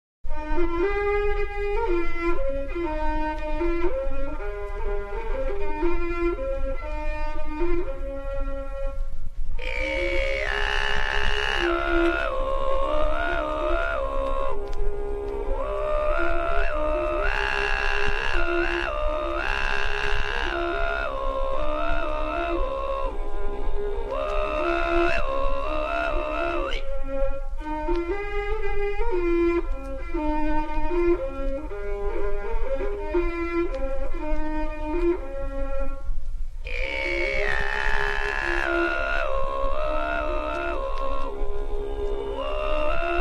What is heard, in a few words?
kargyraa